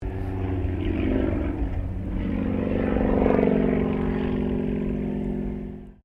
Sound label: 2000 SUKHOI SU-26M PASS, SU-26m Acrobatic aircraft; Airplanes; Vehicles